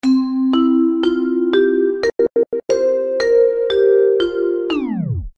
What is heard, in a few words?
Down Sms